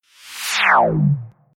Impolsion Whoosh Downwards Scifi Space Spaceship Button Digital; Sound Design FX; Spacecraft Sound Effects